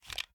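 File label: pistol reload empty extended mag start; gmod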